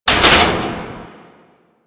Military Checkpoint Metal Hit; Warframe; Ambience; Nineteen Ninety Nine

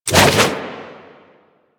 Flip Whoosh; Enemies; Foley; Warframe; Teshin; DE

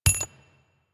Flak Cannon Shell Impact; Warframe; UT Flak Cannon

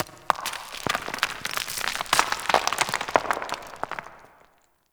Large Rock Crumble H; Ambience; Warframe; Grineer

